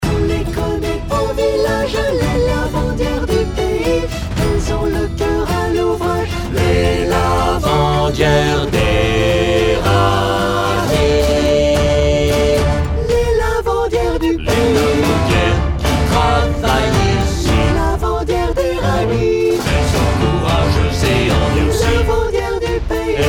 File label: Les lavandières d Eragny Basse